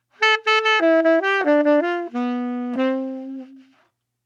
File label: Saxophone 85bpm Am Chill Smooth